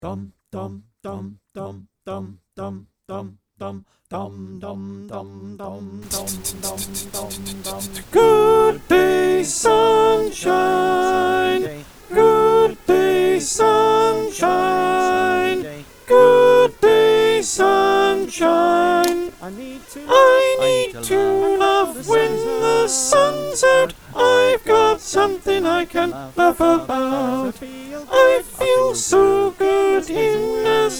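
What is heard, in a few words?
soprano, choir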